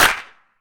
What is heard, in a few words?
short reverb clap sound d sharp key, clap one shot tuned to the D# note